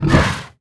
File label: swipe; weapons